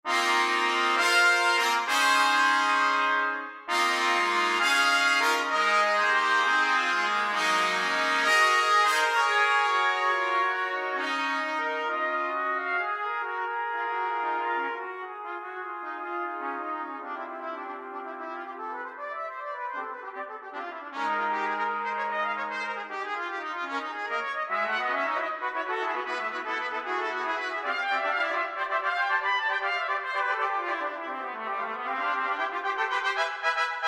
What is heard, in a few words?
Fanfare